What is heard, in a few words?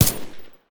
f2000 silencer; STALKER